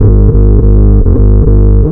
OTHER BASS; Big Beat Samples & More

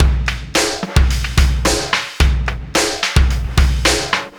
Oscillation Beat

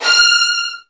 Virtuoso Strings, F3; ILIO; Kirk Hunter Virtuoso Strings VOL-1

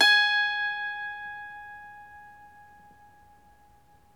IRISH HARP

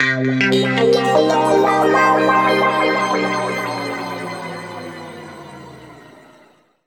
C P-S WA -L, Guitar; East West; Steve Stevens Guitar Samples Collection; PTCH-SHFT+WA

